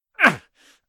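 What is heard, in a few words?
moan, male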